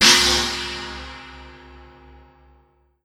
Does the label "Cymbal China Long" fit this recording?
Yes